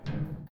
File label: jump metallic; The Swapper